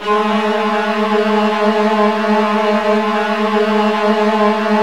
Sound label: TRILLS, Virtuoso Strings; ILIO; Kirk Hunter Virtuoso Strings VOL-2